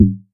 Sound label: Tom Mid; Vari Breaks